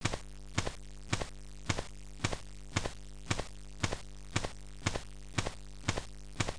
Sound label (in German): Schritte; Max